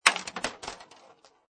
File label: Pinball Sound Clank With Ball Travel; Video Games